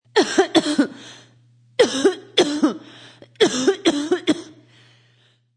Fem Coughing, Female coughing sound.; Sick

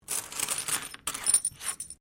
Coin Penny Multiple Move Wood; Common coins; Money; Objects

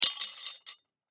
Food Spam Can Lid Set Down; Food (Sound Effects:Household); Foley; Blastwave

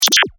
Multimedia Beep, Technology, Multimedia, Beep; Beeps; BlastWave

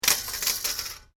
PLASTIC HARD DROP, Plastic junk being dropped.; Sound Effects:Materials

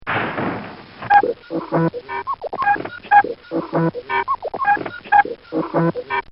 Bangs And Squeaks, car

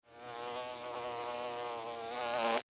Bee, Fly, By, Wings, Land, Insect, Bug

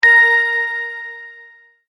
multimedia tone correct; Tones (Sound Effects:Sound Design); McKinney Sound

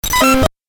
Retro Glitch; Glitch Sound Effects; sound design

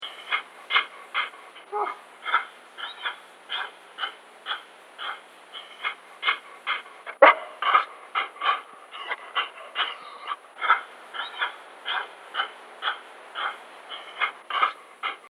Dog Pant Whine Vintage; Dogs; Animals

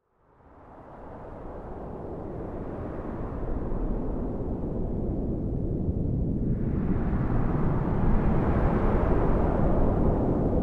Fantasy Thunder Drone; Thunder Sound Effects; role playing games, magic videogames; Andrew Fleming

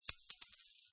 Money, Button sound; Multimedia

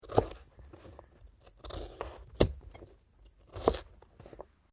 Carrot slice; Food preparation; Sound Effects:Restaurants

